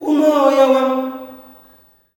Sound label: OUU MO, MALE; Spectrasonics; Heart of Africa 2; S.MALEAMB